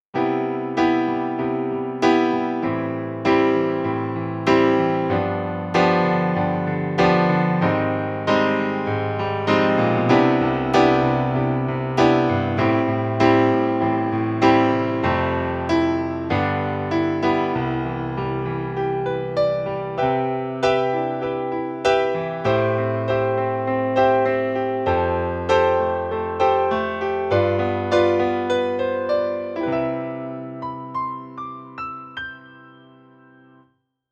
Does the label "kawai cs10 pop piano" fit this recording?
Yes